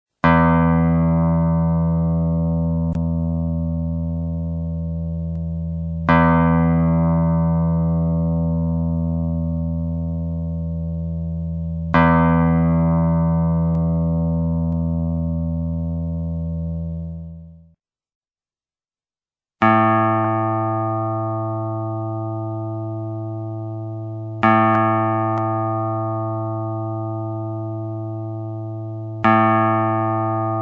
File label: blues jazz